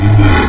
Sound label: jump; quake2; morticus